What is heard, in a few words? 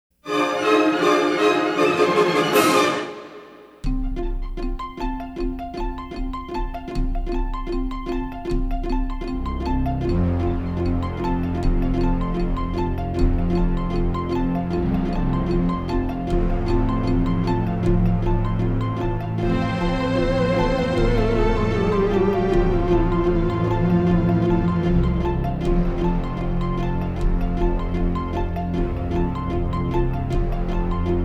Theremin